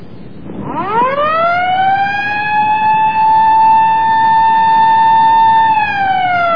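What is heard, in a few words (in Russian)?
машина скорой помощи включила сирену чтобы объехать пробку на дороге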